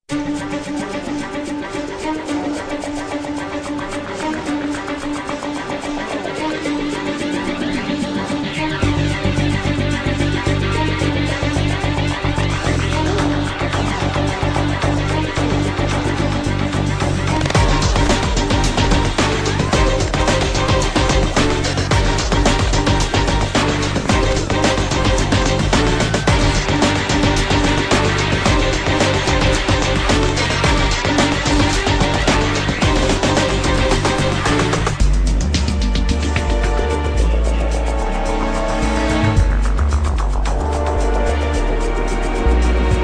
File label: string